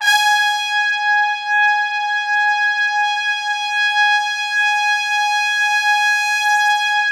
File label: G#4, Orchestral Brass; Best Service ProSamples vol.20; AKAI